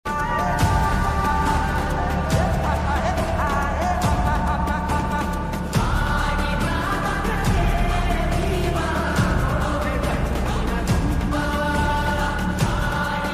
song ringtone; bheema movie

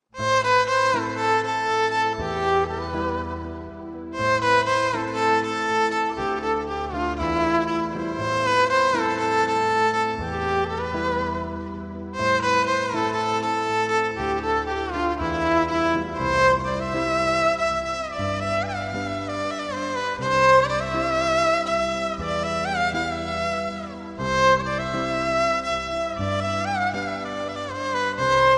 violin bgm ringtone; tamil

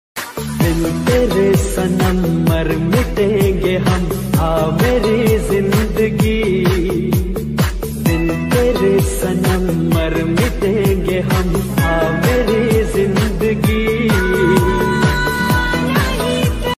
instrumental ringtone hindi songs

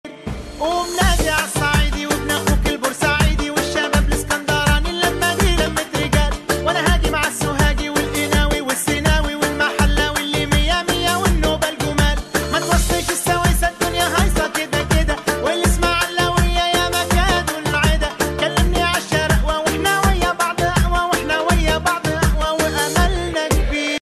arabic ringtone

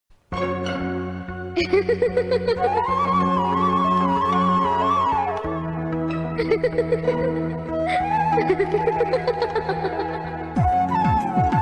romantic love bgm

